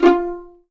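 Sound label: F4 pizzicato forte left, violins; East West; The Ultimate String Collection; VLNS PIZZ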